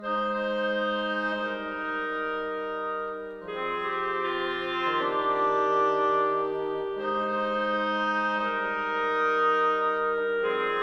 berceuse trio live

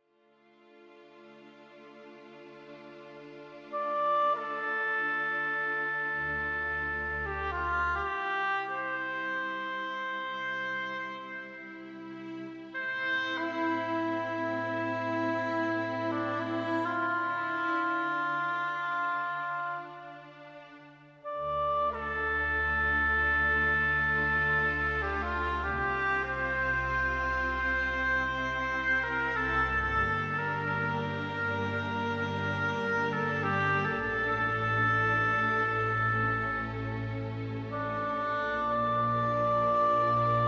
oboe; POTC

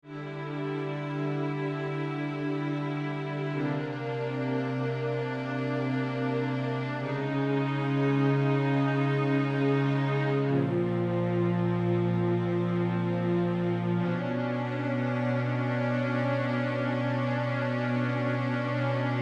flowers blow

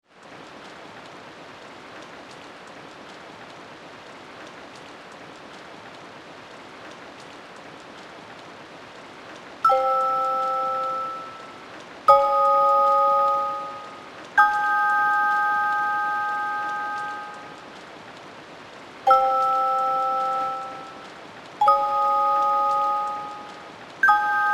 rainy

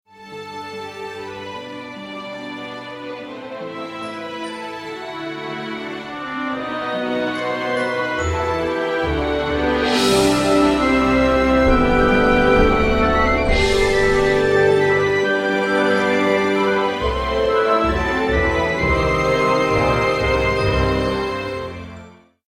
romantic theme

